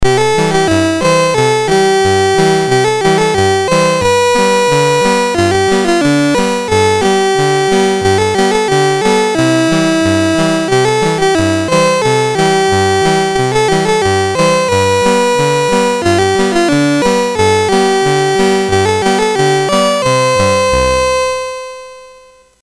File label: Christmas Album BASIC, Commodore MOS6581R3; C64